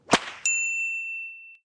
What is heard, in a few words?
Success